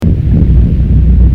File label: dub